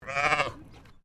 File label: Animal Sheep English Leicester Baa; Sheep at Collingwood Children's Farm